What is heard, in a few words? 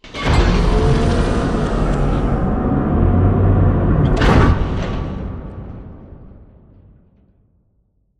Robot Arm Turn 180 Close; Boomtown; Titanfall 2